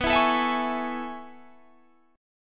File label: A Major 7th B; strummed; JGuitar